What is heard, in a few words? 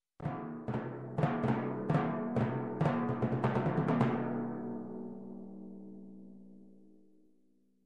Majestic Timpanis